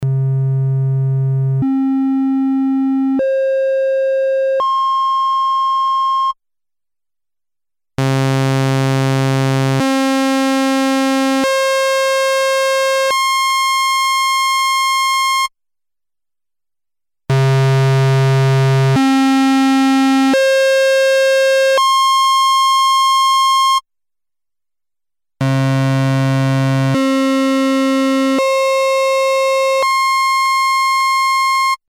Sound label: osc, VCO shapes; MFB nanozwerg